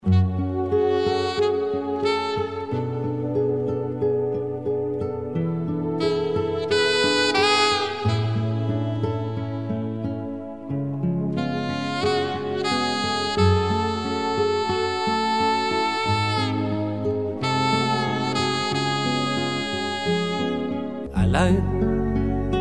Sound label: chorus; spirituelles wissen; hl woche